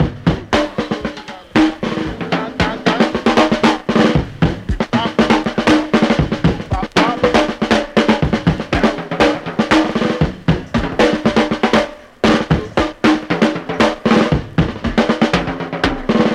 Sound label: boogaloo, Drum loops, Funky